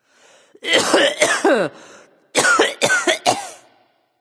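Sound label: clear throat, female; smite; voicepack; hera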